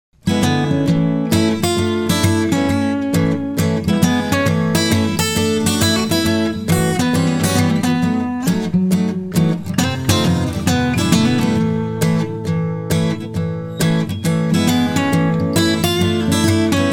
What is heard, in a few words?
ragtime